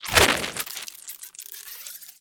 Spider Die; Creatures

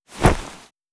Open Bag; UI

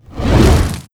Wall Of Fire; Battle; Wizard

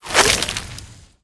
Spider Attack; Creatures

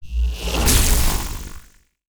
baseattack; Characters; delina

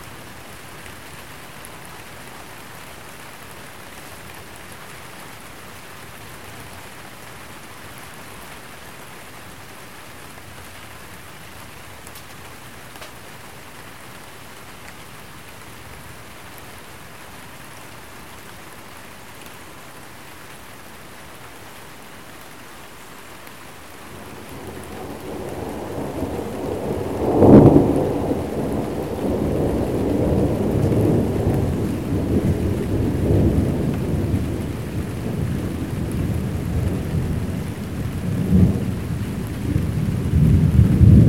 Light Rain with Thunder

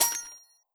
Coins

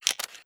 Take Item Sound; UI

